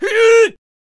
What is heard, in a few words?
Squire Attack Vocals